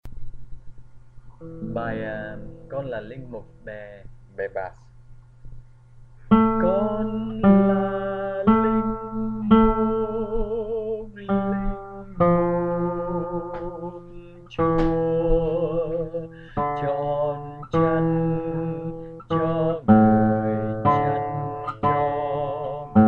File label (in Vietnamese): Con Là Linh Mục, Basso